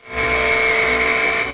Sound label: BUZZ